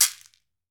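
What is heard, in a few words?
large CAXIXI, Latin Shakers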